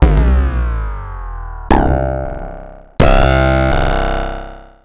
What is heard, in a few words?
Bass Weird Loops